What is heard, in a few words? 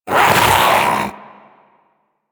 Vocal Attack Short, Hunting Critters; Warframe; Vulpaphyla